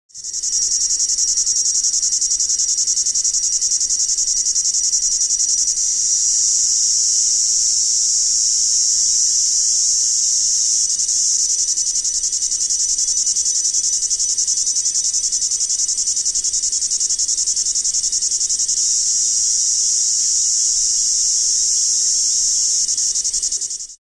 Huechys sanguinea

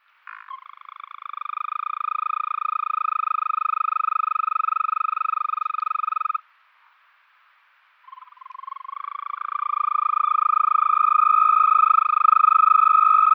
Bufo viridis

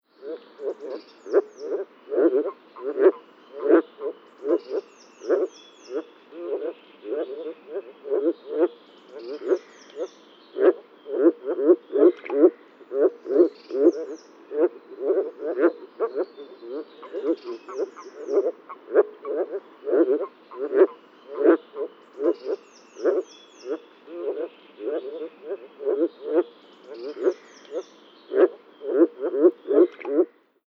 Rana arvalis

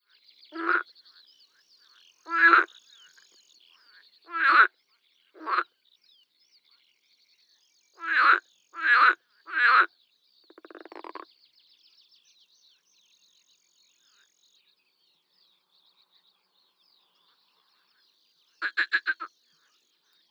Rana ridibunda

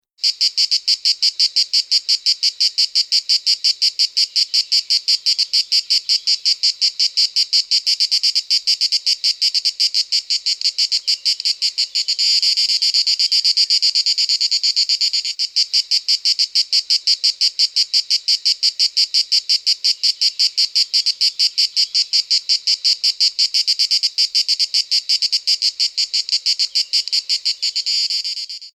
Cicada orni; TomiTrilar

